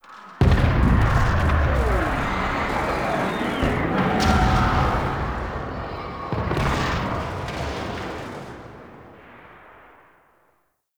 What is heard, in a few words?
ambient battle distant; Warframe; Tau